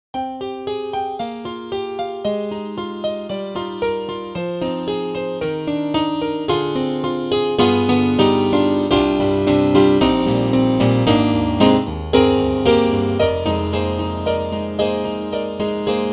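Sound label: YAMAHA EX5 Brite Rock, piano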